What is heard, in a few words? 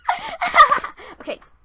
AHAHAHA! OK